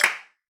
clap f sharp key, Royality free clap sound tuned to the F# note. Loudest frequency: 3106Hz; single hits one shots